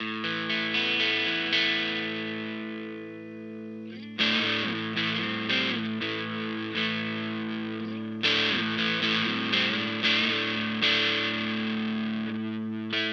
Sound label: Orange Terror Stamp amp